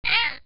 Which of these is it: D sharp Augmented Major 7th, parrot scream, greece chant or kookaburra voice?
parrot scream